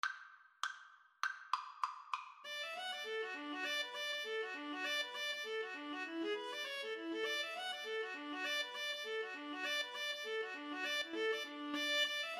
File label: Viola Duet